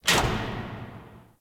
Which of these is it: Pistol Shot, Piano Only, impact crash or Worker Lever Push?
Worker Lever Push